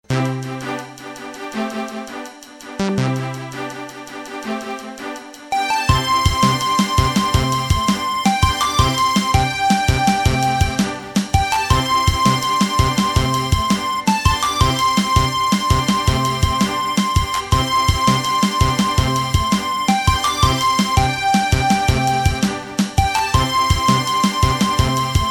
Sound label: tune; Orange Israel